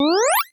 BEND WAVE; Greytsounds VOL-1

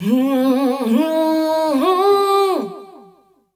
Dance Vocals, FEMALE

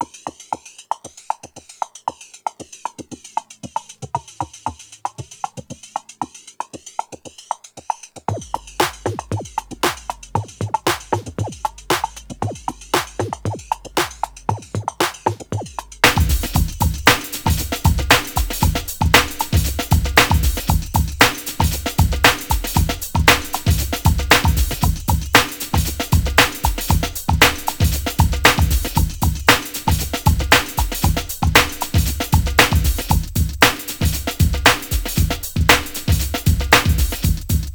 116 Funky Slowrave, Breaks; Lockdown Breaks

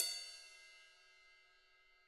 Drums, TAMA; ILIO; Double Platinum Drums 1; TAMA+TAMA

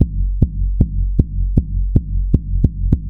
TABKICK, Ethno Techno; ILIO - Ethno Techno

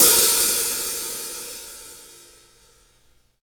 XV Hat O, Roland XV-5080

